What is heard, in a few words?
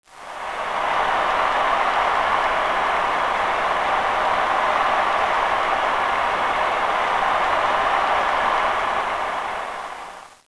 sports stadium crowd